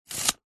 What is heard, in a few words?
industrial tape measure retract fast close up